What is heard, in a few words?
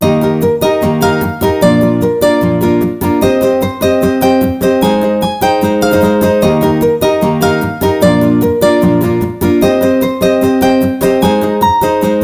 ringtone; Realme C15-Qualcomm-Edition; ringtones